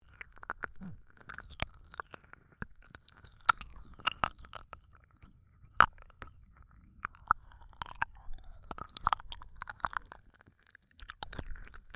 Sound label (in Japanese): 耳舐め音